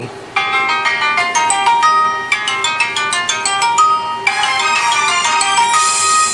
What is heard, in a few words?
sms